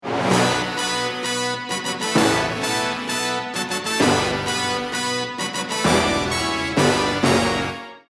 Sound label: ringtone main theme